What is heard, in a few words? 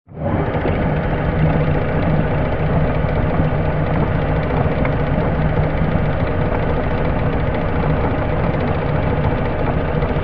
Crane Large, Industrial lifting crane. Start up, run steady, stop; Start up, run steady, stop and wind down with squeak; Construction Vehicle Sound Effects; Lynne Music